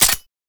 Lato Low Clip; weapons; Warframe

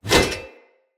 Whoosh, Swords; Weapons; Mockery; Turash

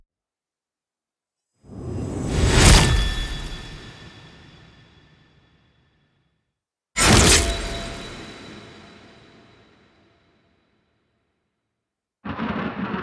Sword Stab; Ballas; Warframe; Cinematics; DuviriQuest; Courtyard

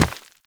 Operator Run Rock, Footsteps; Warframe

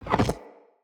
Fire Rain Ground Rupture; Fenn

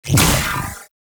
Shot; Warframe; The New War; Weapons; Omicrus

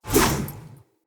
Sphere Polearm Throw, Weapons; Player

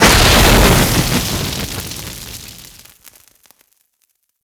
Flame Burst Short; Warframe

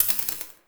Laser Skin Printer Laser Stop; Warframe; Ambience; Entrati

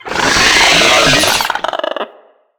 Moa Enemy Spotted, Vocals

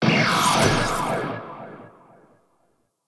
Exalted Equip 3D; Warframe; Temple

